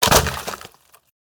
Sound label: Natural Wood Staff Underlay Death